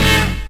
RAP HIT; Kid Nepro - Elite

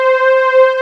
SOFT PAD G4, SYNTHESIZER; STACK SYN

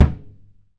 HAND MUTE, Surdo; E-MU Producer Series Vol. 2; More Studio Essentials; Composer

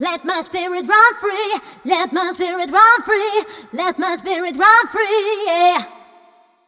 f mel f, fem Vocals; Mutekki Media; Diamond Vocals 2

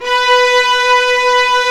String Vlas Sect Agitato

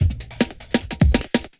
LOOP, Drum & Bass, 150 BPM

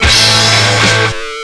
RIFF GTR, GUITARS KIT; Big Beat Samples & More; AKAI

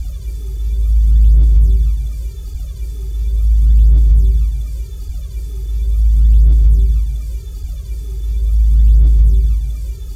ATMOSFX; Best Service; Advanced Mega Effects

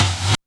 REV SNARE; Back In Time Records; Big Bang

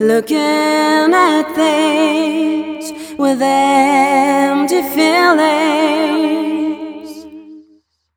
f mel e flat, fem Vocals

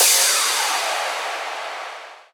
Crash, Cymbals

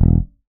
bass stab

